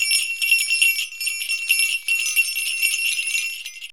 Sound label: SLEIGH BELL; DRUMS & PERC